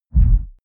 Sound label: sub impact short rumble